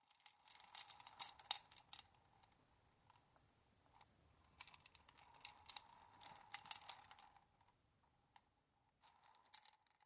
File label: Rock sugar sound